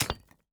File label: mine stone